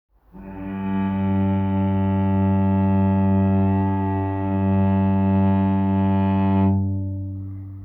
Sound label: cello g2; tuner